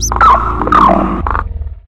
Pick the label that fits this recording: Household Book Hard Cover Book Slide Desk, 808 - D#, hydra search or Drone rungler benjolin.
hydra search